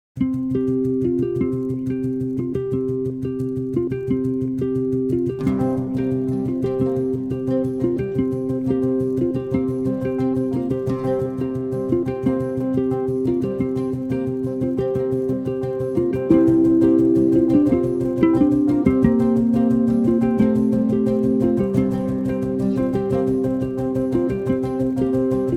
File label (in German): Outro MUSIK MIX; ungepegelt